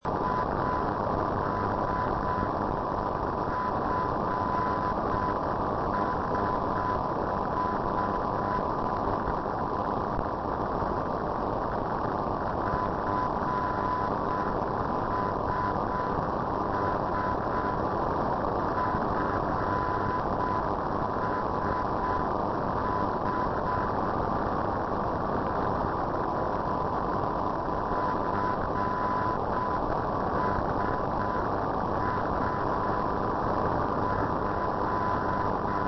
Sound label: ndb fetesti